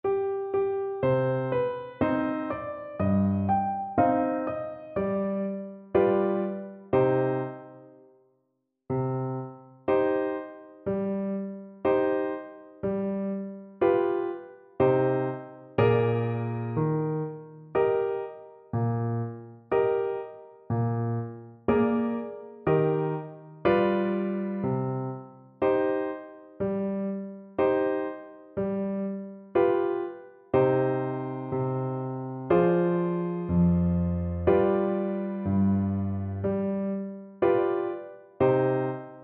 Clarinet

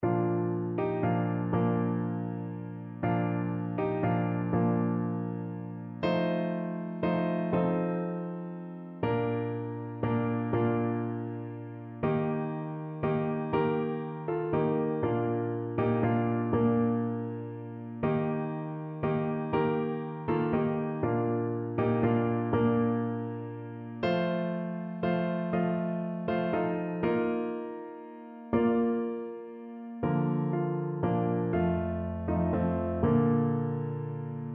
silent night piano

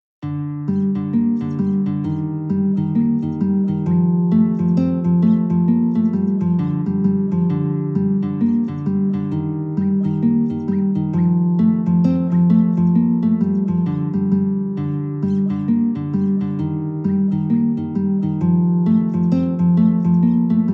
guitar

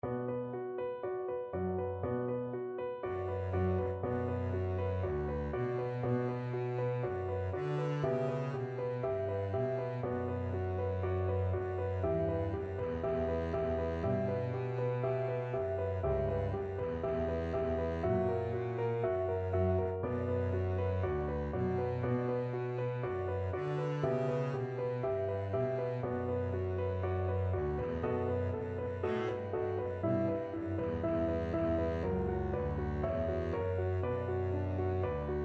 violin, double bass

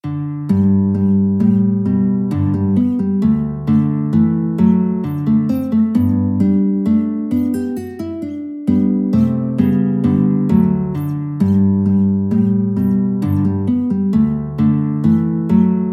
Minuet, guitar; Haydn

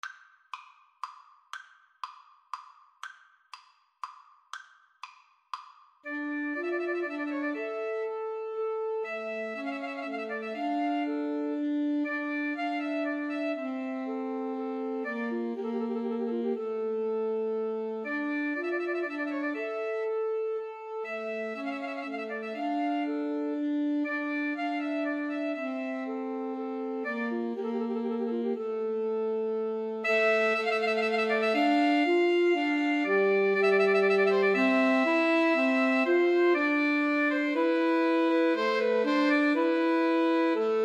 pastorale Alto Sax trio

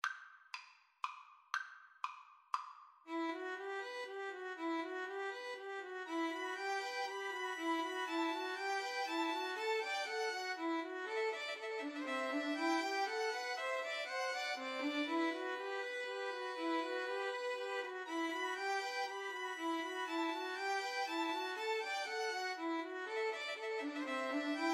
Spanish Dances VLN trio

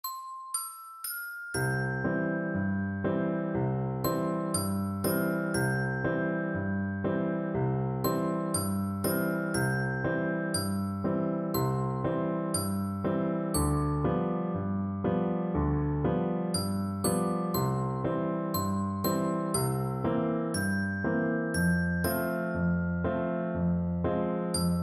GLK

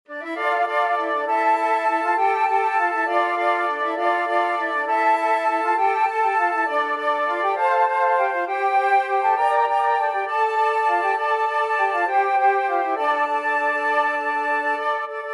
flute choir

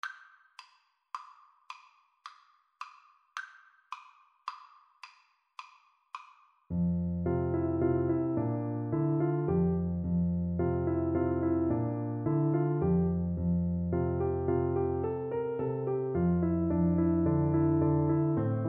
lakme, Tuba

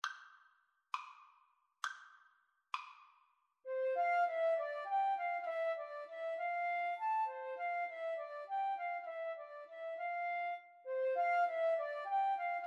Flute Clarinet Duet

